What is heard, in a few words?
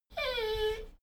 dogo argentino whine short; natur-tiere; hunde